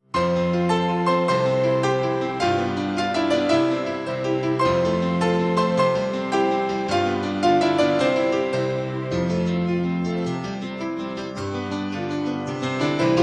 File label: instrumental